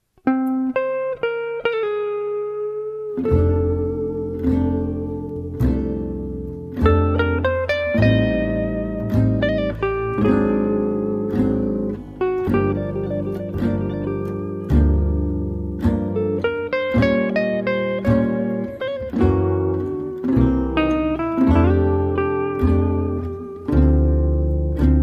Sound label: swing express vamp